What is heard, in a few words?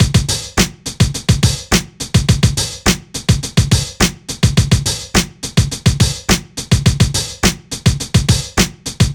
105 Breaks; Rsvlt Rolla